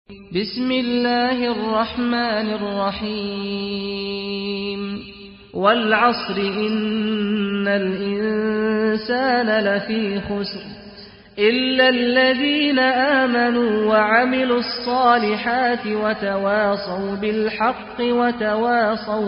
surah asr ringtone, Islamic Ringtone

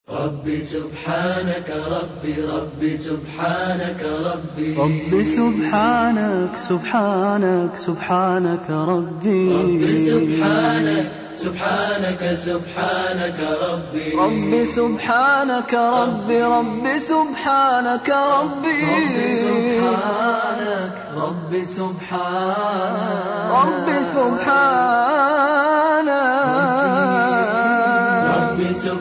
Sobhan Allah; Islamic

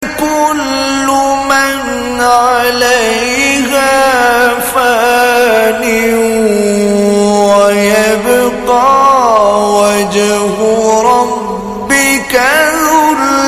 Kullu Man Alaiha Fan, islamic ringtone

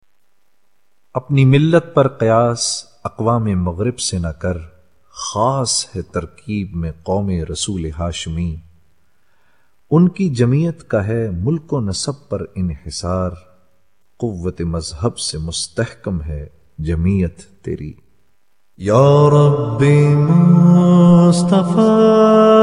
islamic naat

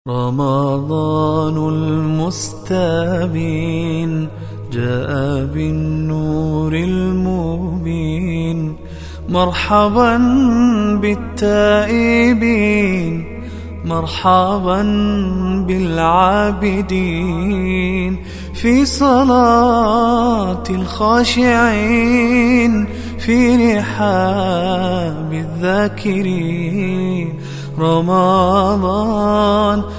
ramadan ringtone

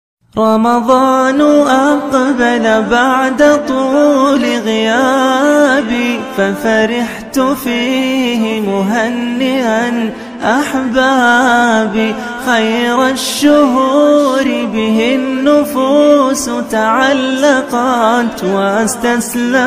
Ramzan Ringtone; Islamic

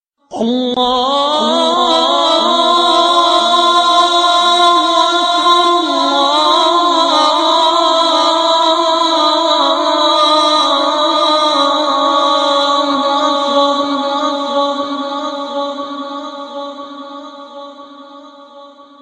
azan holy makkah; Islamic

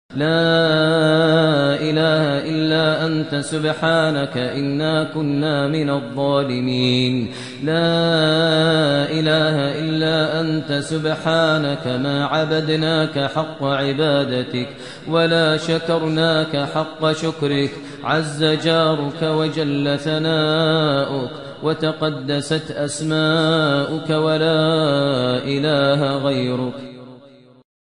islamic ringtone

